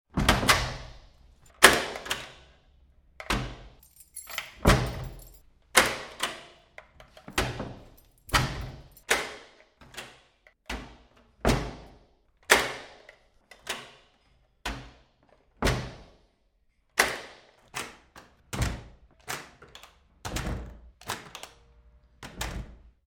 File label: Security Door and Lock, Various open close and lock unlock; Prison Doors